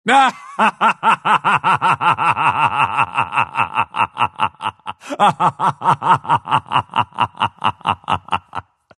pangolin laugh; dota

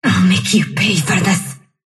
sylph death; dota; dark willow

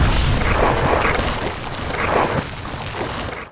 lizard blob projectile; weapons; dota; snapfire

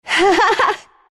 laugh; dota; enchantress

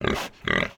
pig snort; soundboard

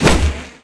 projectile, damage; player; physics

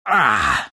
riki pain

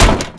attack; dota; brewmaster

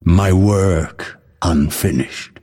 death; dota; phantom assassin

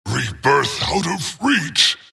death long; dota; skeleton king

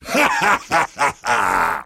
laugh; pudge

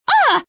pain; dota; puck

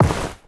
robes drop; ui; inventory

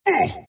wraith pain; dota; skeleton king

